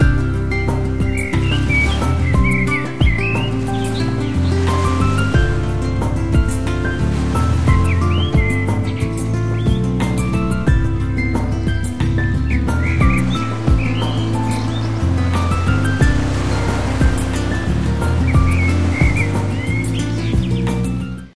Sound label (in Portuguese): musica relax natureza